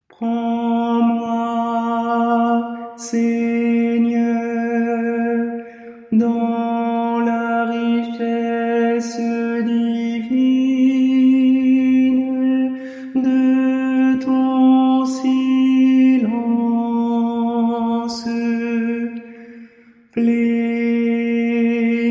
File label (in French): ton silence ténor